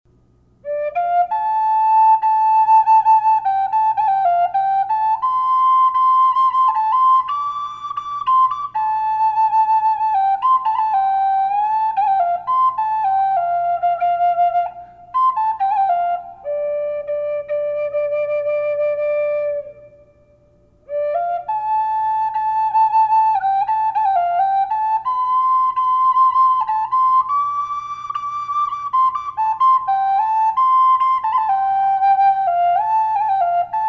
high D, flutes; collector flutes

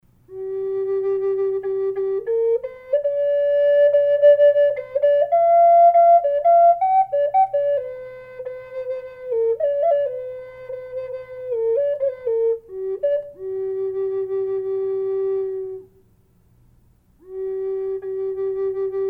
redwood burl, flute; collector flutes

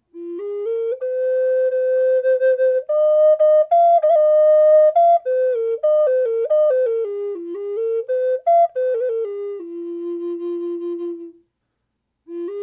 owl e flute dry

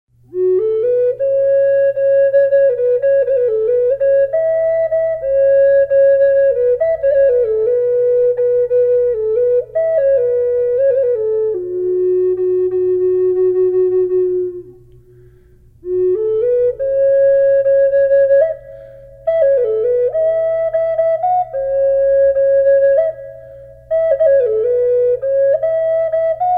black wolf reverb f sharp, flutes; collector flutes

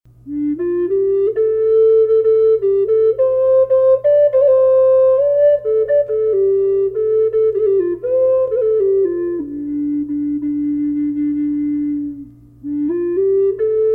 low d redtailed reverb hawk, flute; collector flutes

